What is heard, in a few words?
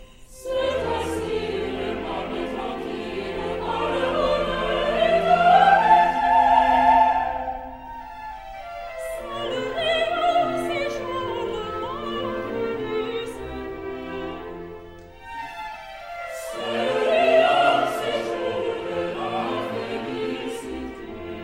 Coro; Orfeo Euridice